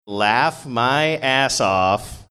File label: laugh my ass off